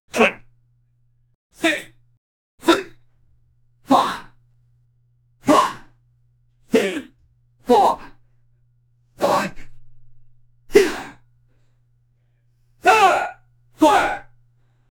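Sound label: intense high energy; breath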